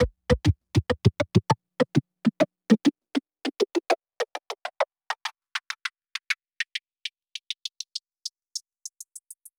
Filtered, Organ, Loops, Effected; Sonic Foundry (Sony Creative Software); Joe Vitale Organ Donor

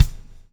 lash, backbeat

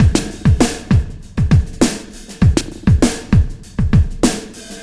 Sky Lab Beat, Processed Drumkits

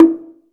LOG DR HI, LOG DRUM, Africa

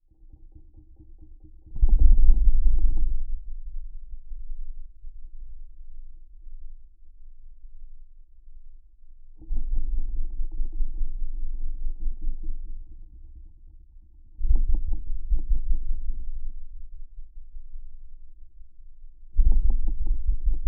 Geofon, Earthquake Simulation; underground sounds

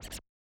Yamaha DJX 2B, Scratch Push

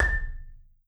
SOFT A5, Marimba; Sampleheads; Dave Samuels Marimba & Vibes

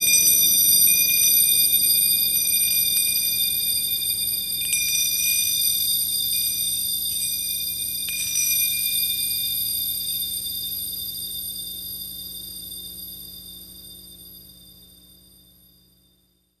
Handbell, Percussion

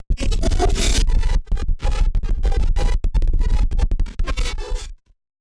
Battered Synth; malfunctioning synth samples

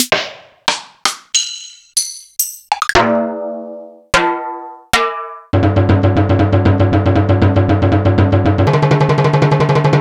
Yamaha TG-5001

